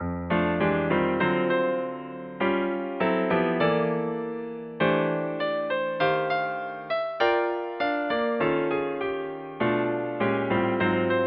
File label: Piano